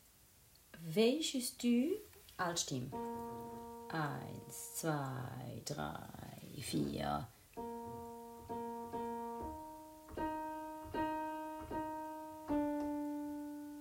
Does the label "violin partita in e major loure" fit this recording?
No